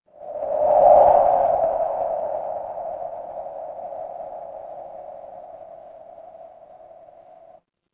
horror wind whisper

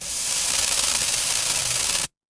fire electric spark

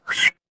cartoon slide whistle

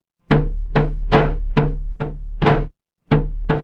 mechanical gear

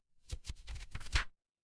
paper fabric page turn

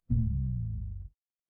feedback fail

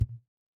ui alert

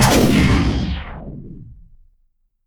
magic scifi laser

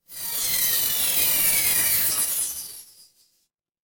magic scifi spell

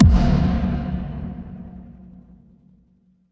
transition impact hit

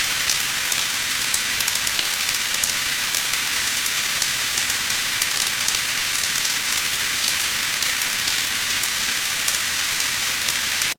ambience rain

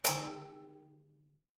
impact metal hit

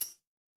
ui chime

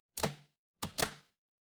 ui toggle

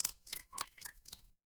water drip

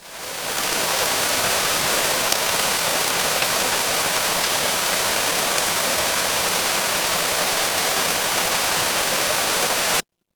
ambience rain

